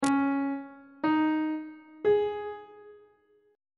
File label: c sharp suspended 2nd triad chord intervals on treble clef